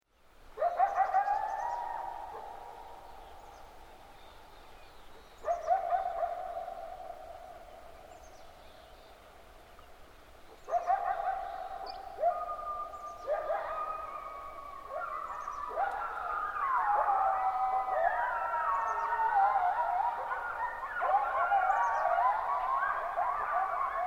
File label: coyote chorus